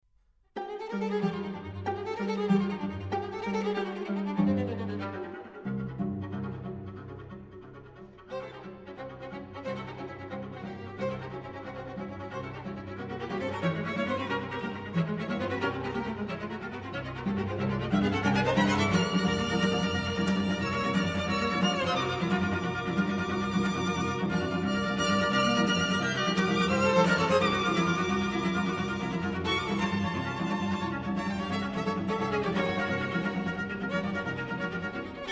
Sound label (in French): cinq pi ces pour quatuor cordes alla tarantella; schulhoff